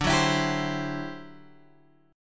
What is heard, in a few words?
D 11th D; D11 Chord {10 9 X 9 8 8} strummed